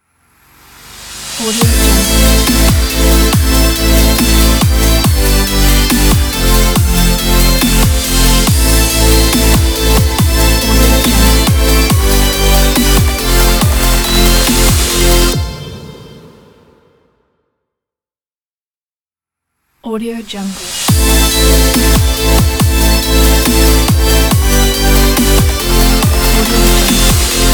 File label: modern future bass ident